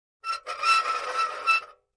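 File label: Metal Scrape On Cement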